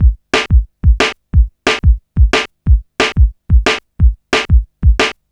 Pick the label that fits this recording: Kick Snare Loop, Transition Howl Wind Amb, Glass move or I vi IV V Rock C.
Kick Snare Loop